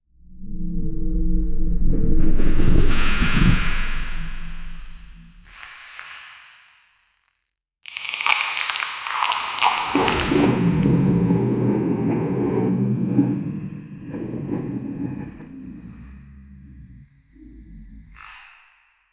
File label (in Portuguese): crie um sound effect cine, transformation